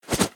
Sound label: Card Flip End; Idle Champions of the Forgotten Realms